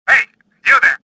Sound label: anger